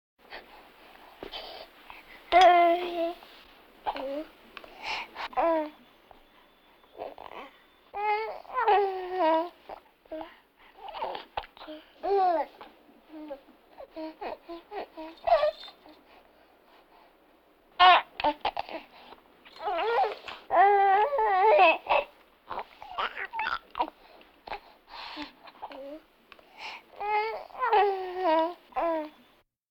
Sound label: Vocal effects breathing Moaning Baby